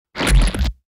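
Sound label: splash gooey, funny cartoon sounds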